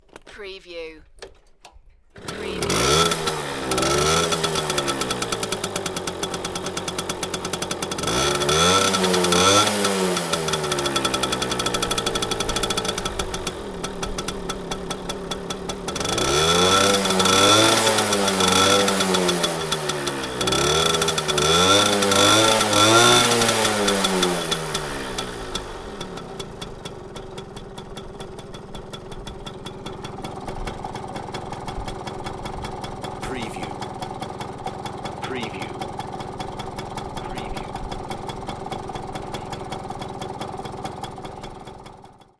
Moped, Two-Stroke Engine, Start Up, Various Revs, Then Idle, Ticking Over For A While